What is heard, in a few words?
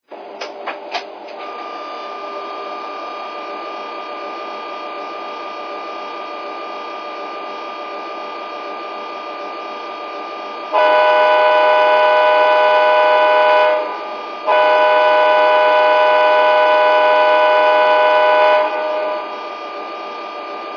alp46; njt